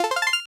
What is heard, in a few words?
win